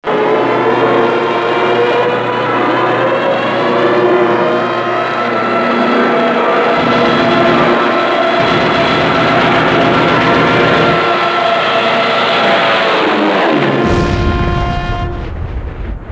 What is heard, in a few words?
kamikaze; CONFLICT